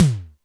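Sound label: Ol' Hex, Alesis D4 drum machine tom; 300 Drum Machines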